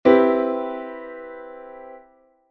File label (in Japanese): CM7コード; ジャズピアノ